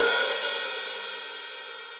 Hihat Open Direct Natural; Drum Hits; Standard Hits; Ableton; Factory Packs; Session Drums Studio

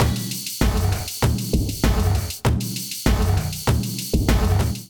Kit groove 98 bpm; MIDI Clips; Clinical; Ableton; Drum Essentials

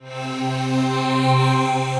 Custom Bowed Instrument, Distressed Strings; Instruments; Ableton; Mood Reel

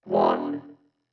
120 Neo-Wave Vocoder

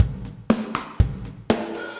Dark Plate Verb, drums kit; Standard Kits; Ableton; Factory Packs; Session Drums Club

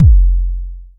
analog tonal, Kickbank

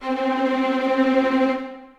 Strings Ensemble Tremolo; Ableton; Orchestral Strings

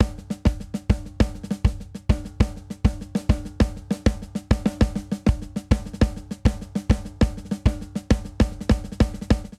Reggaeton Buildup 100 bpm; 4 4; MIDI Clips; Ableton; Factory Packs; Drum Booth

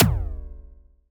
Alien FM Perc Short

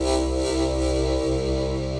Harp Texture, Distressed Strings; Instruments; Ableton; Mood Reel

